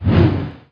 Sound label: Log Swing; Asterix